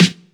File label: bassy steel snare drum sound f sharp key